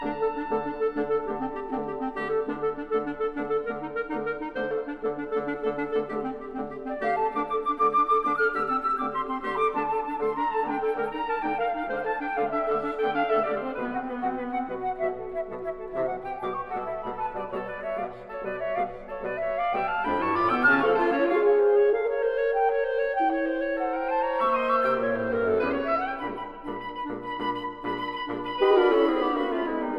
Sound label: Suite Hermetia for Woodwind Quintet